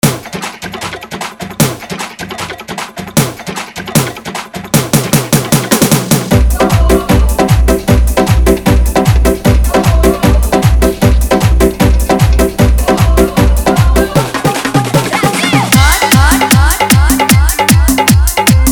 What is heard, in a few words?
Dhollki Bass Dance Mix, Hindi Dj Song; Hi-Fi